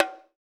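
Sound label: percussion high bongo; Roland L-CD701; Latin 1; Bongos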